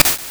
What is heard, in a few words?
catch; P3D-Legacy